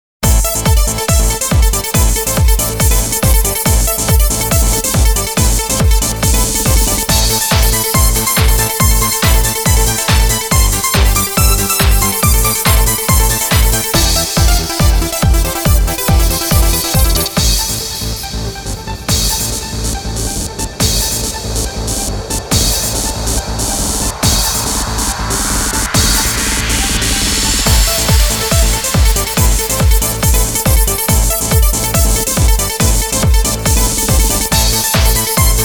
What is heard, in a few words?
russian beat; yugo negotiator